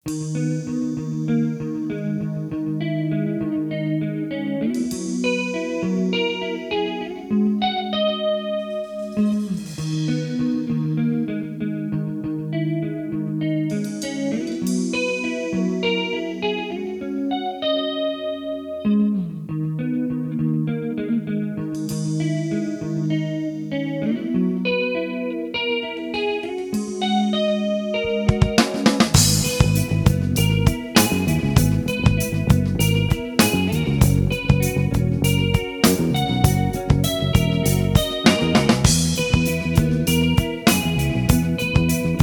Instrumental

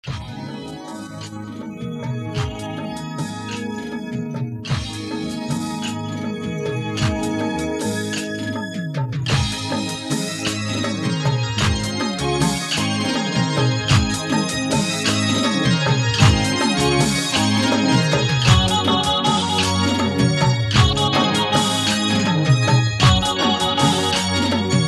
Instrumental

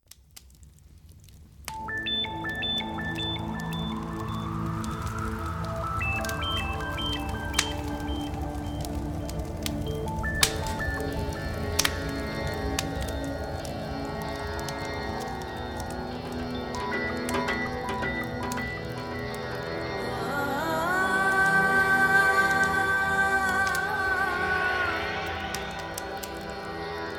Duet

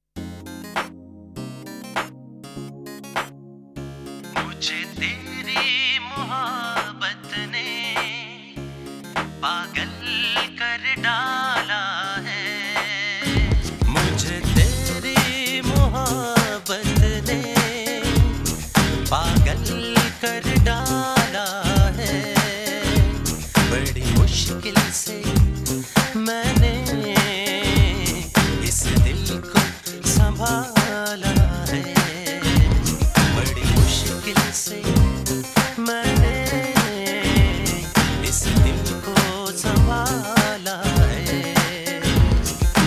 Duet

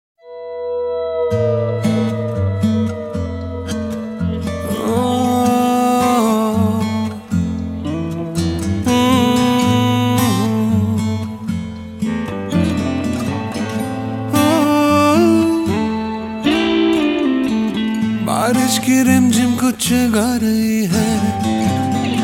Unplugged

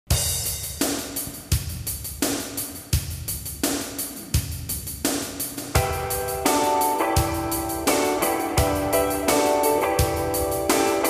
Instrumental

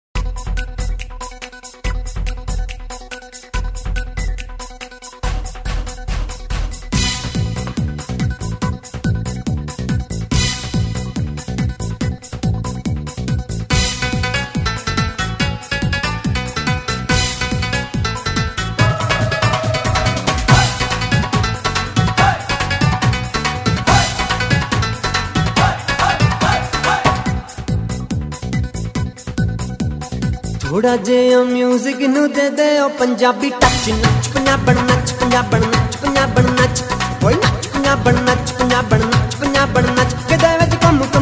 Punjabi Touch

